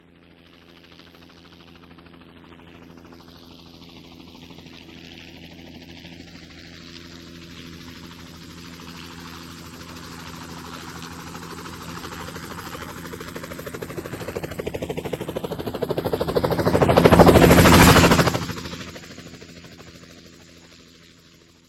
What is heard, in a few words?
Helicopter Sound Ringtone